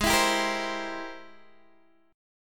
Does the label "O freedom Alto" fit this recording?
No